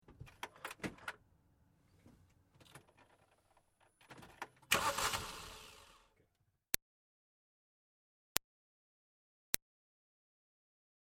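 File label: Car Smart Car Outboard Ignition Clicks; 2005 Smartcar Fortwo; Cars (Sound Effects:Vehicles); Big Room Sound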